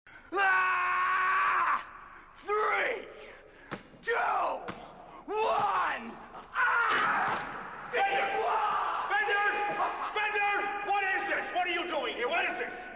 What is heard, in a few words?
victory